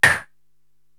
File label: Vocal SD; Korg TR-Rack